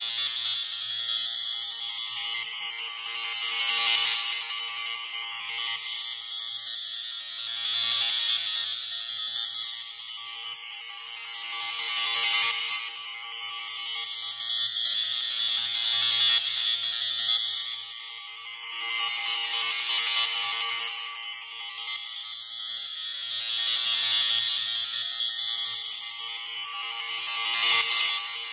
Binary digital high pitch glitches with modulation; granular